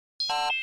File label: Computer Beep High P, beeps